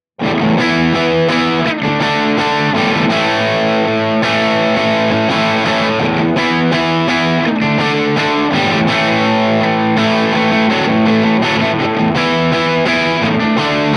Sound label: crunch; jensentone